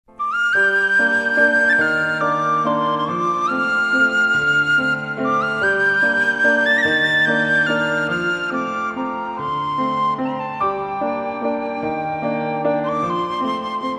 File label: lovely flute